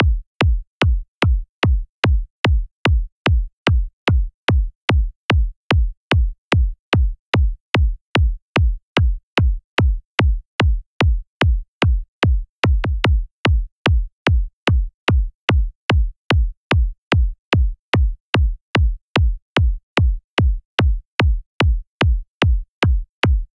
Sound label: bgm kick; Pac-Man Championship Edition 2